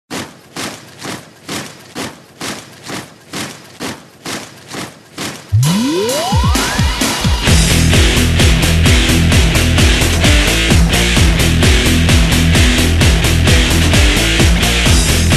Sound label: instrumental